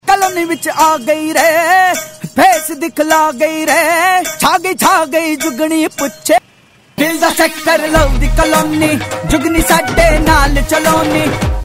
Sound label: Coca Cola new ad Punjabi song; Deepika